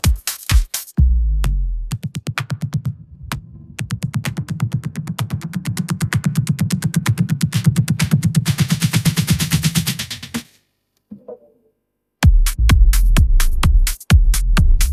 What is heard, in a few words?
ringtone edm